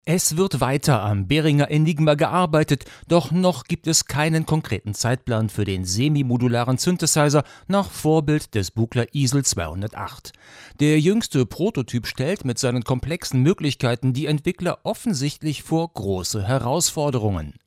Maono PD400X XLR

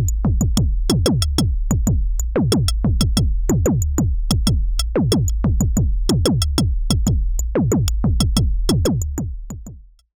Behringer Syncussion SY 1 Groove

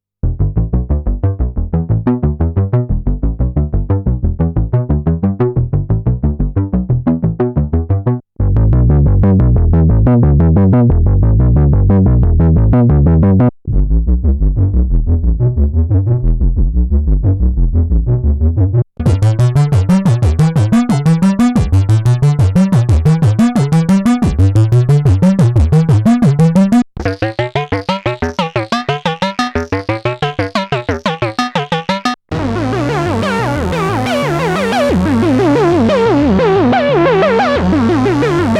Motor Synth MkII Some Bass Presets; Gamechanger Audio

